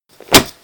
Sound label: Face Punch